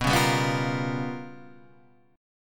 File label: G 7th Sharp 9th Flat 5th